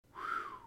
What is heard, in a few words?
Exhale